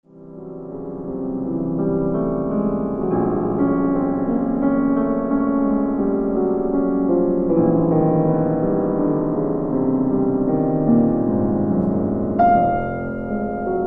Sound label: Preludes for piano Andantino